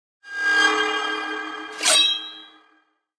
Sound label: Logbook